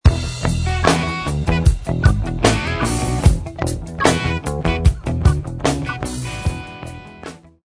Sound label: mixolydian funk